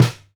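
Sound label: snare drum Acoustic Bland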